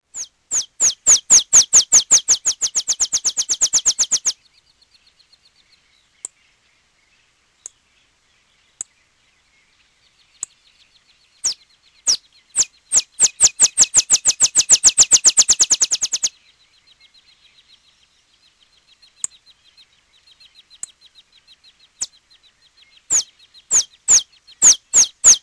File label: olive sparrow